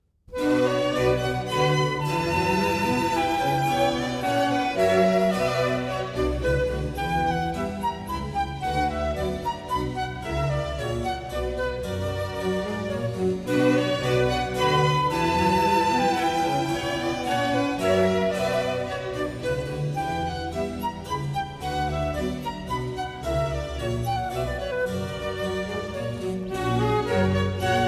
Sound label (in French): Suite Pour Flûte et Orchestre; Johann Sebastian Bach; Classique